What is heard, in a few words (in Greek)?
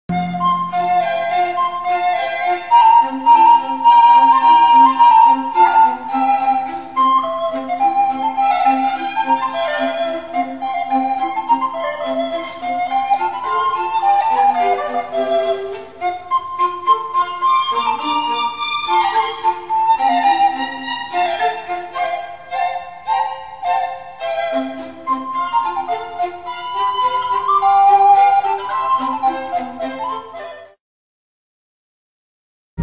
Φλογέρα Concerto in F